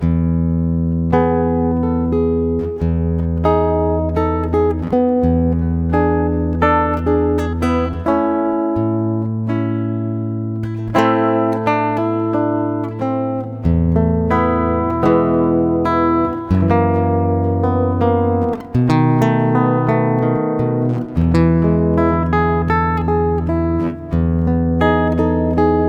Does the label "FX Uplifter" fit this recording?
No